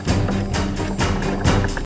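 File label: mountain world music cage forcefield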